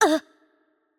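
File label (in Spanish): Hurt Light, Spanish; VO; es ES; bloom minigame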